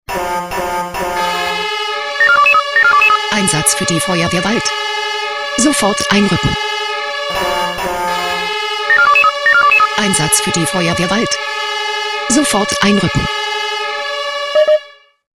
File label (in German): SMS Alarmierungstone; FW Wald